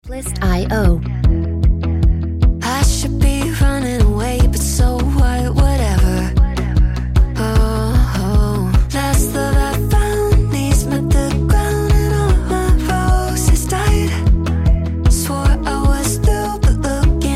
Ringtone

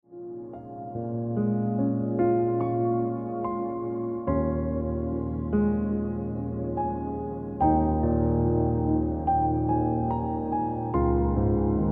Piano Music

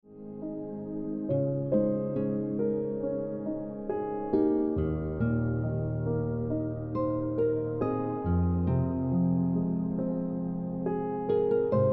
Ringtone Piano Music

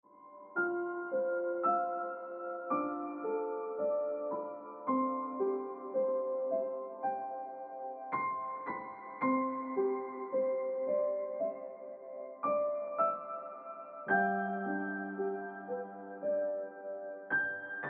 Ringtone Piano Music

